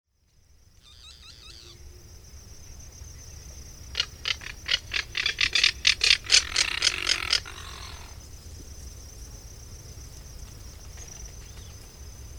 Caracara plancus, Zuidelijke kuifcaracara; roofvogels; Falconidae; Falconae

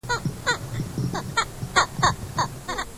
Aquila Heliaca, Oostelijke Keizerarend; roofvogels; Accipitrinae; Accipitres; Accipitidae

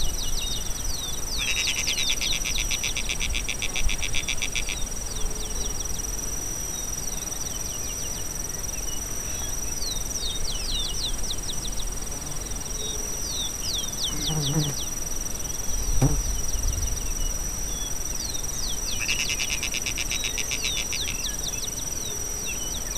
Rostrhamus sociabilis; Slakkenwouw; roofvogels; Accipitrinae; Accipitres; Accipitidae

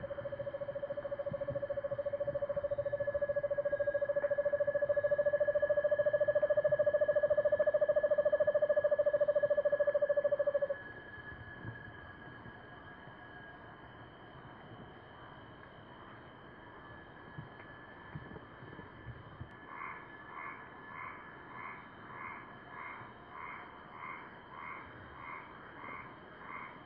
Megascops guatemalae; Roodwangschreeuwuil; uilen; Strigidae

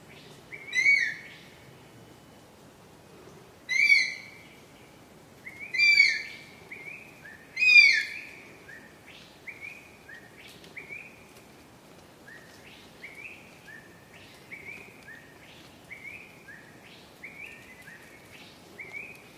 Pithecophaga jefferyi, roofvogels; Accipitrinae; Apearend; Accipitres; Accipitidae

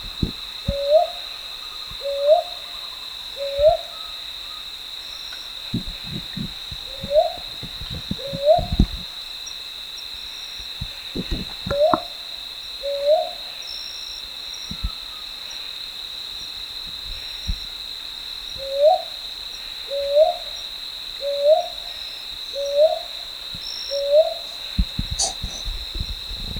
Ninox scutulata, Zuid Aziatische valkuil; uilen; Strigidae

